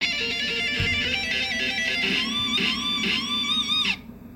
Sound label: GTR RIFF; LEGO BATMAN